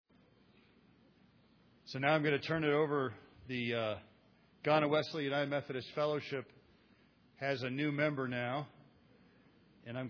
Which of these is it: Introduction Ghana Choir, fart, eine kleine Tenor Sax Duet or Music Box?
Introduction Ghana Choir